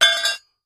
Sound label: Bracket Drop On Cemen; crashes & creaks